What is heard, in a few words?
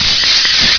can spray